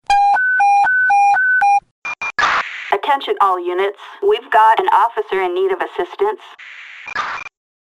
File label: panic